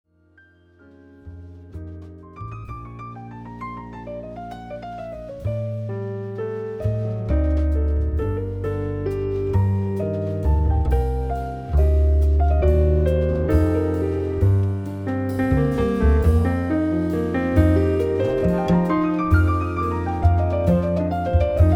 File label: mazurek g-moll